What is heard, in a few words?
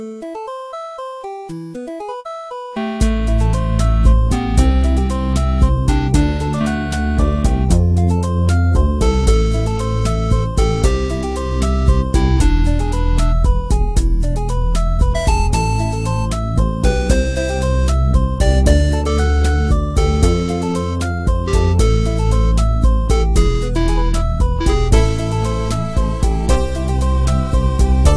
8-bit